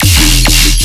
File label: Bass Hit E, Dubstep